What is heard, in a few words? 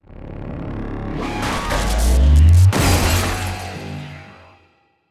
Skill Ironscrap; starbites